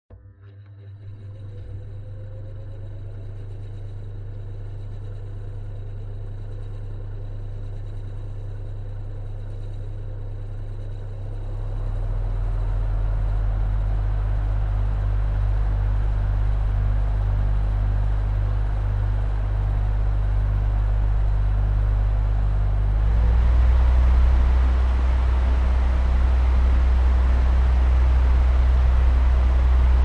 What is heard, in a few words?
Electric Fan All